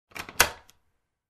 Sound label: Door lock click sound